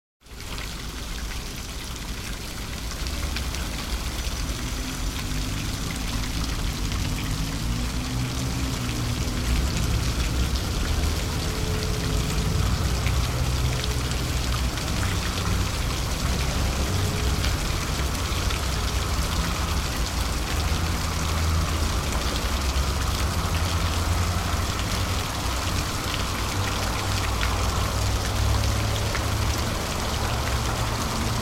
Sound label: Public fountain in city centre.; Cities (Sound Effects:Backgrounds)